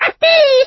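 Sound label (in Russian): мультяшный звук осла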